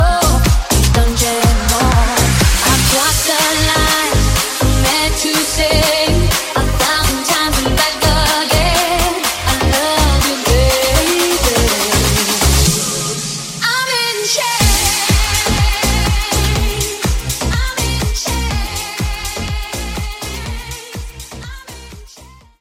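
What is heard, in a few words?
Club Mix 123 bpm G minor Slam End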